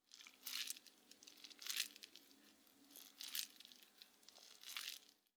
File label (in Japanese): おにぎりを握る音